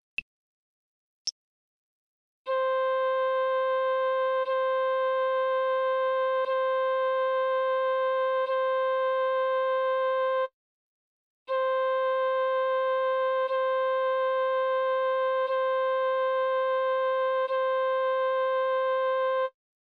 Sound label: c flute